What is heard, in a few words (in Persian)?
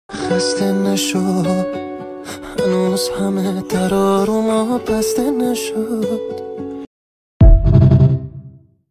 music, آهنگ کوتاه غمگین ایرانی